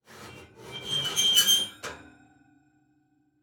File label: Household Oven Shelf Movements Screech